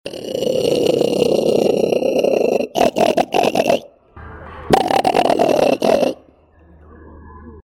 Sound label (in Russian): zvuki prirodi tulen obiknovennii ili nerpa phoca vitulina